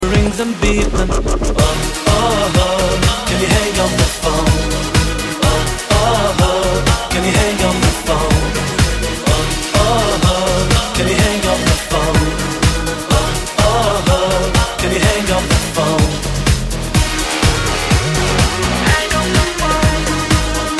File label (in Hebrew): רינגטון